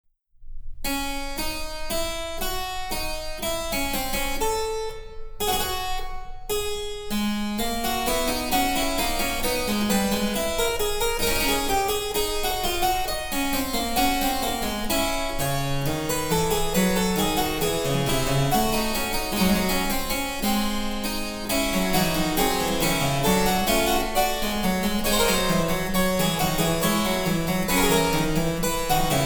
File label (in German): Cembalo; Mark Lindley WTC 1